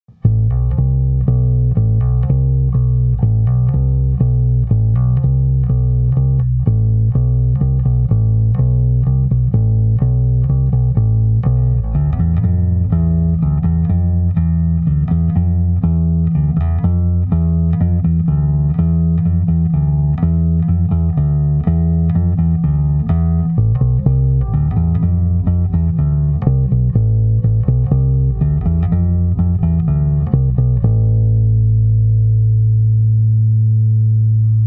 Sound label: credit music